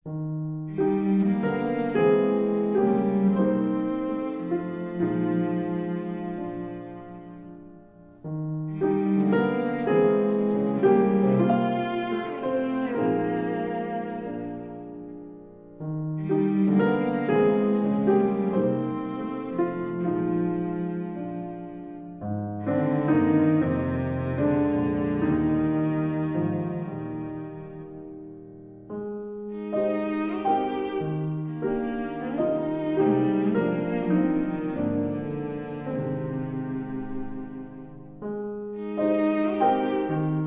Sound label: With Strings